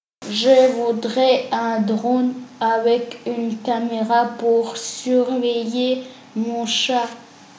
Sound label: drone